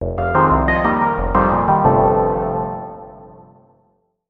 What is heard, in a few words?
jingle